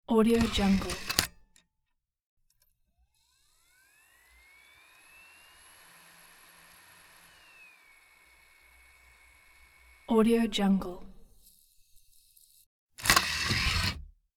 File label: cd rom tray movement with heavy whir